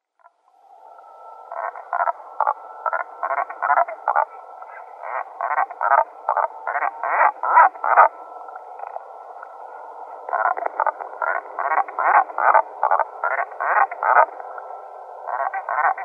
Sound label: Wandering Albatross